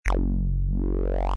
tech sweep down n up short; Dystopia

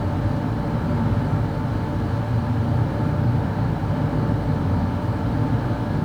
Indoor Ambience; Dystopia; injection

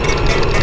elevator moving loop

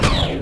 elevator stop

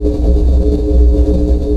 Beam Loop; Dystopia; injection

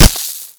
sand impact, Bullet Impact; Dystopia; physics

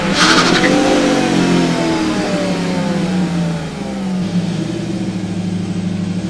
brute throttle off, vehicles; Dystopia

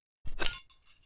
pin pull, grenade; weapons; Dystopia

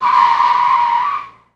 brute slow friction, vehicles; Dystopia

